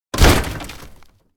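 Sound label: car hood impact; wreck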